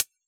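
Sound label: hi-hat Nat Closed, Yamaha DJX; 155 DJX Kit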